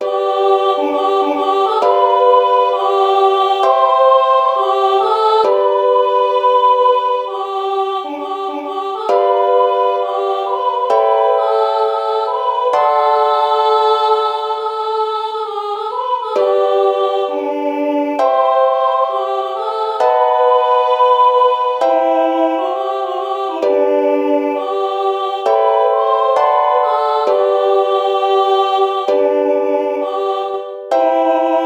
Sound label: ukulele